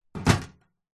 industrial metal toolbox with tools inside set down concrete